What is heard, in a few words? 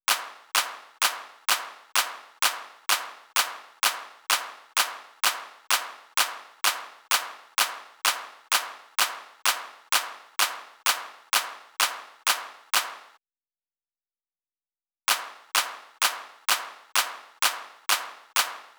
128BPM Clap; Essential Clubsounds Vol.5; Break the Chains; Root G#min; Drums & Perc